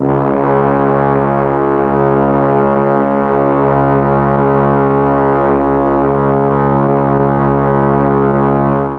C#2 BRAS, Mellotron; HALFSP.BRASS; Mike Pinder - Mellotron Archive CD

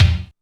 Gated Kick; Roland MV8800; K-100 E CHIP

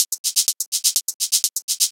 Hats 125, Perc Loops, Old Skool House

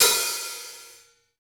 Rock Open Hi Hat, Roland BK-5

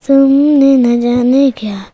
CHILD VX C, Indian Traditions

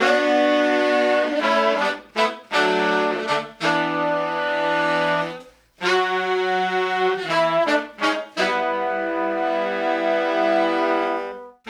Soul Horns, 70s Analog Funk; Sonic Foundry (Sony Creative Software); What it Is!

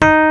RHODES D4; E-MU Formula 4000 Series Vol. 3; Analog Odyssey; VK Instruments

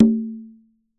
A, congas tuned; Q Up Arts; Denny Jaeger Private Collection

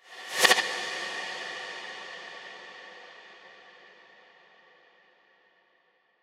shutta

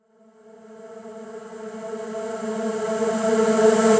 Reverse Vocals A; Vengeance - Essential Clubsounds Vol.4; Effects

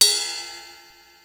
Dance Ride, Ensoniq ASR-X Pro

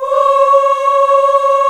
AFRO AH C#5, CHOIR AHS; Spectrasonics; Heart of Africa

